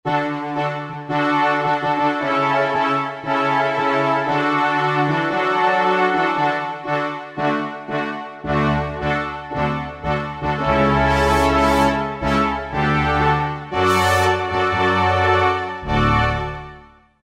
RS-202 Brass, vintage synth; Roland SR-JV80-04

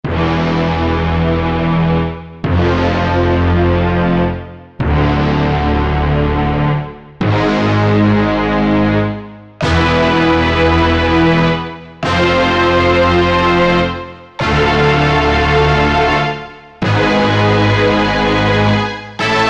Orchestral, E-mu Proteus VX

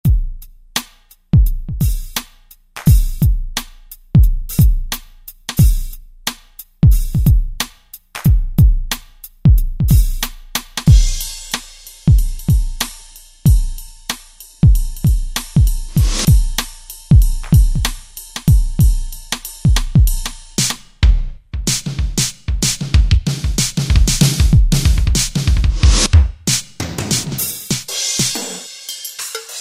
Modern Kit, Korg N364; Factory Prog demos

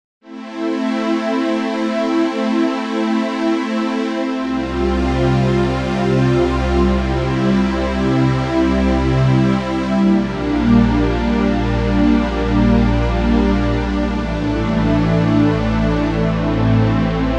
Pad, Tradi Pad

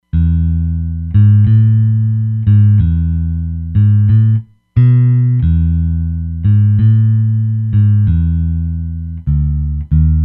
Roomy Bass, Roland Fantom XR

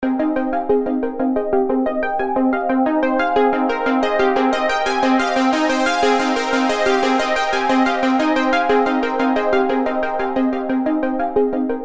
Dance Floor Pattern; Apple GarageBand 2; Apple Loops

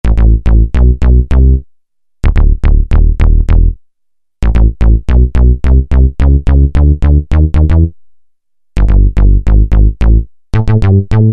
80s Bass, Roland SR-JV80-19 House Collection

